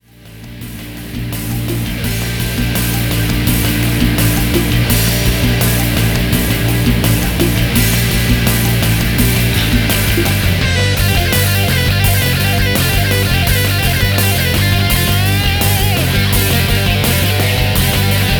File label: Professional soundtrack